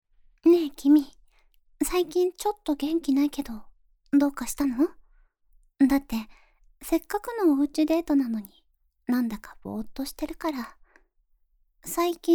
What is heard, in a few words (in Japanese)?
まったりお姉さん彼女のフェラチオご奉仕; VoiceLotion01